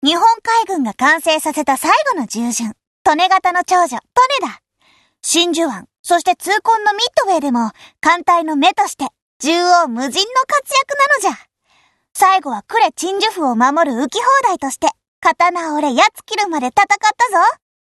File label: Tone